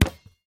Basketball Pass; doodle jump 2; android

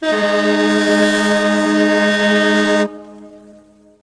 Foghorn

